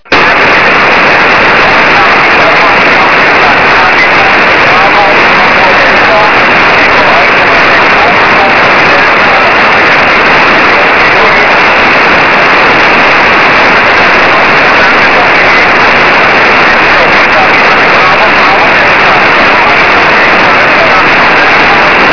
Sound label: SSB eme 23cm